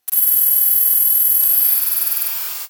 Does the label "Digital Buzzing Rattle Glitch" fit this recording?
Yes